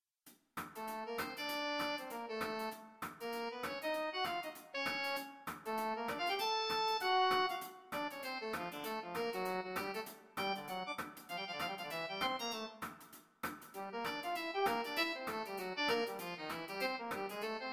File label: Swing Etude